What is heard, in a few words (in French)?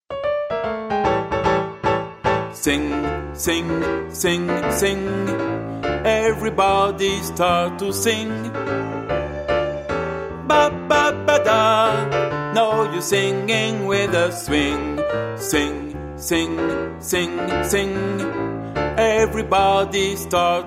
LENT BARYTON